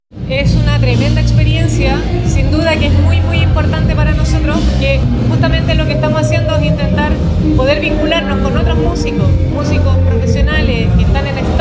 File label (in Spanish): Música Ensamble; expo quillota